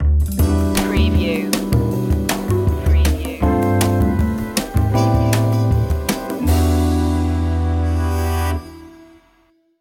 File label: jazzy opener